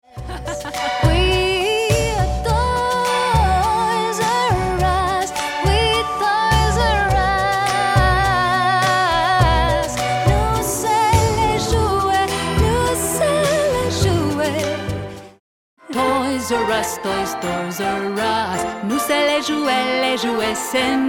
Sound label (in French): JINGLES